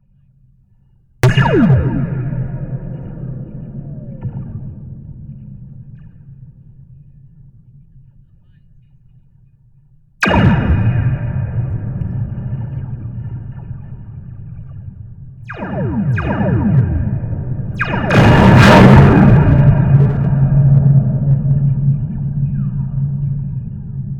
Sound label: bang, slinky